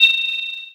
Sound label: goal